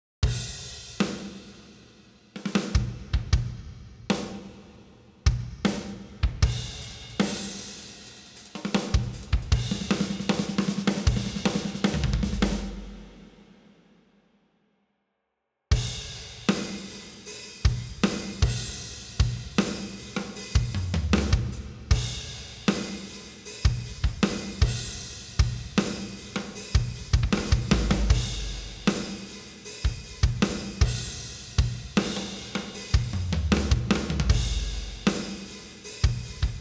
LOUD ROCK ACOUSTIC DRUMS COMPRESSOR ON

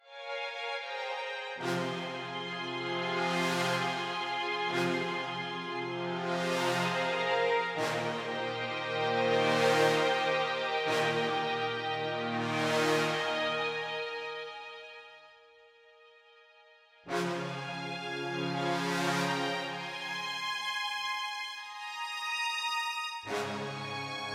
LOUD ROCK STRINGS

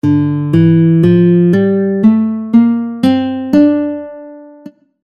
D Minor Scale

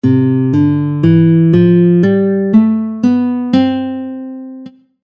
C Major Scale

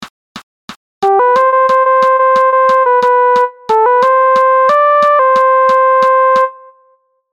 Bright Melody

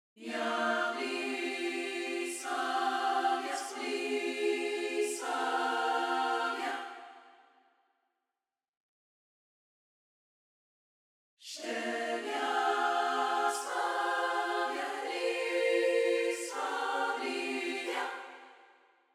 KONTAKT THIRD PARTY PLUGIN ON

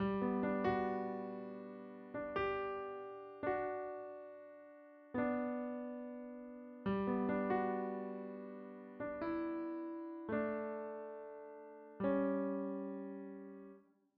INTRO PIANO DRY; KONTAKT LIBRARY

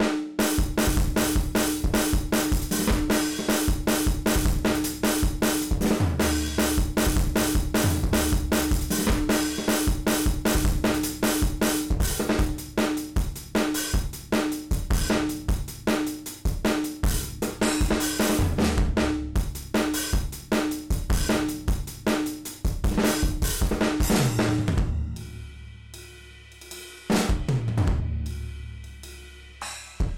GARAGE ROCK DRUMS DISTORTION

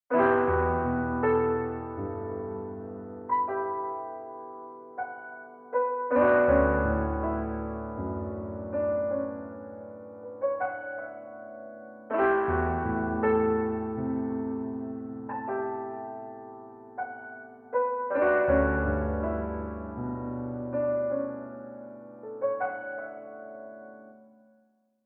TRIP HOP PIANO TRACK ONLY

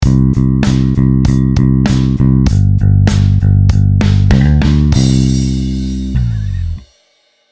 Loud Bass Drs

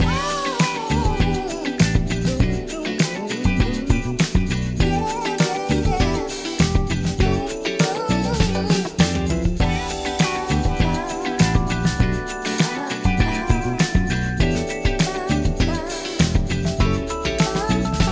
FUNK GROOVE SAMPLES SONG PERC ON

